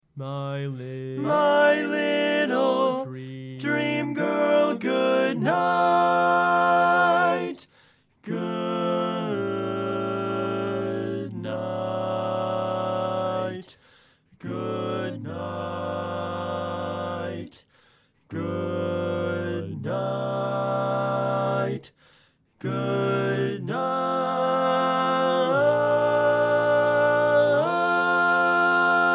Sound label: Lead, Barbershop Tags